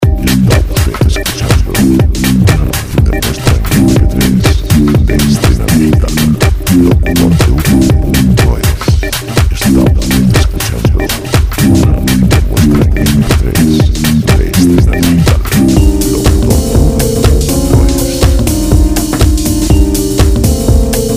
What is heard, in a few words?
122 dance